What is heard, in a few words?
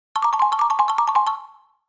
Xylophone Cartoon